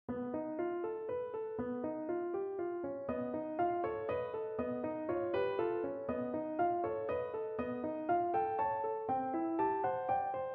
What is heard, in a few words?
fantasy piano excerpt; Aquatic